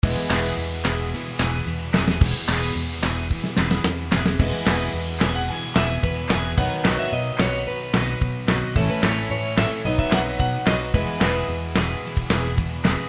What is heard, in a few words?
harpsichord